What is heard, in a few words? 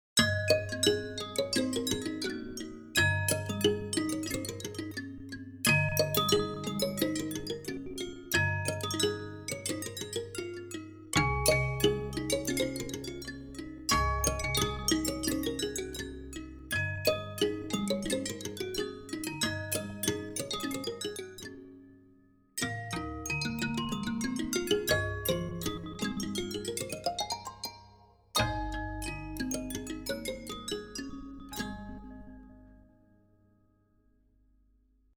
Mbira & Mixed Metals